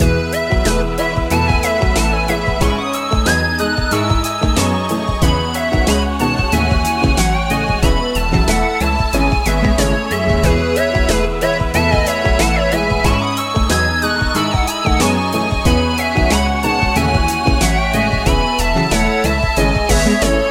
Pause Menu; Friday Night Funkin Computerized Conflict linux macos windows gamerip 2023

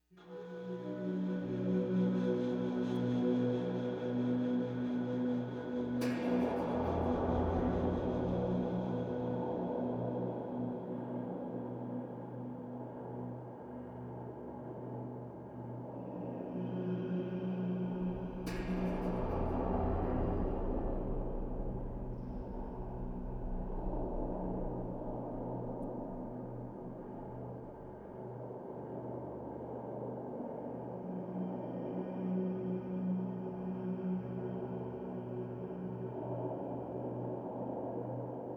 Duduk Bed; Stronghold Crusader 2

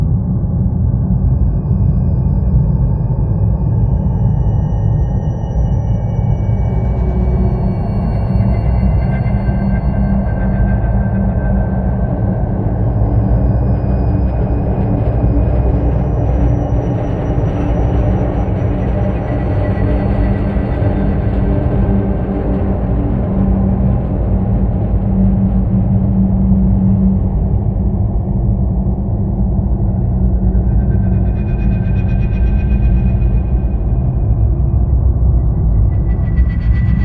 ambient mood; Shattered Horizon; nostone

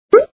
cork pop; Dad 'n Me

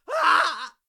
Voices jack surprise; Plants vs. Zombies

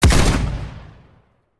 Ithaca Fire; criminality

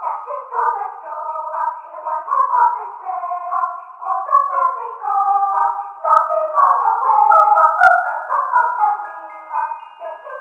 Radio Loop; 2004 ps2; Polar Express

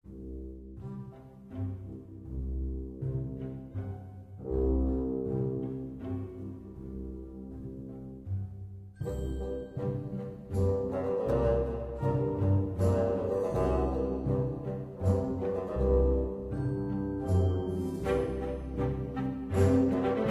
town theme; Tempest Pirate Action RPG

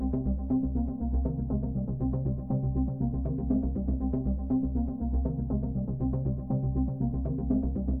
Menu Layer; Tron RUN/r

